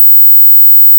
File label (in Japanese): 16000Hz, 「ちーっ」という音; 「ちーっ」という音に聞こえませんか。